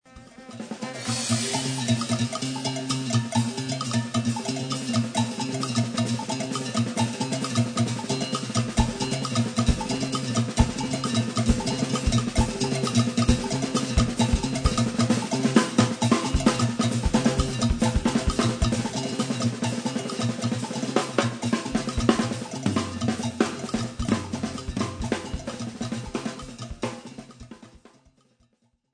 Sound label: berimbau